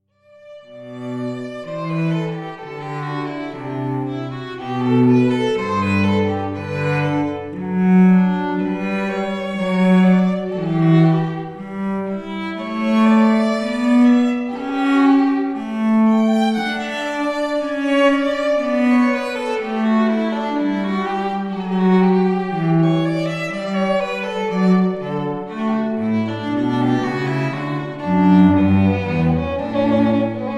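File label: Wachet Auf Duo, String Duet Wedding Music; Bach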